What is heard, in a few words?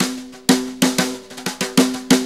Brushes Fill; Sonic Foundry (Sony Creative Software); Loops & Samples Drum Tools